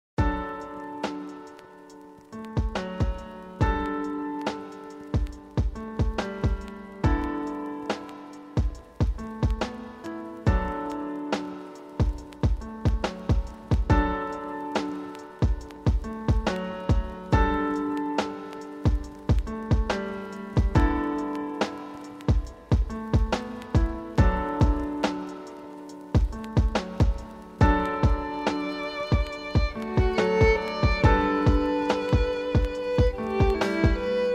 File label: Chill Violin Example